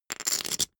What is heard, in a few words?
poker chip stack big rattle